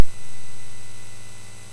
MOS6581R3, GAMES; Spikes Peak

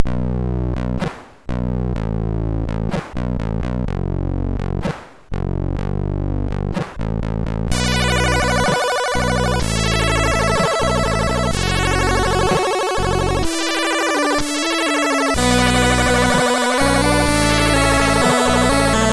End Tune sid MOS6581R2; Bluez

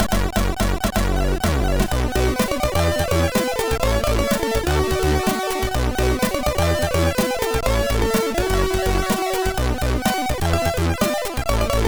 tune, sid MOS6581R3

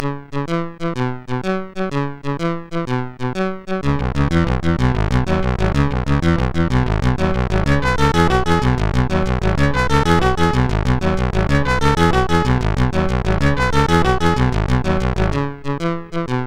Jazz, MOS6581R2; Jazz-es

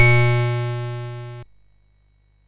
Final Synth Sample, MOS6581R3

